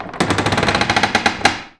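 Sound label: boat creak; The Elder Scrolls III - Morrowind; Morrowind GOTY GOG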